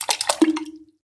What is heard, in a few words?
Poo Plop Sounds